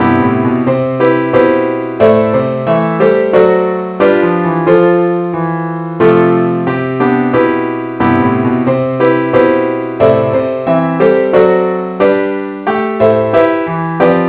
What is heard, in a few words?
keyboard only key C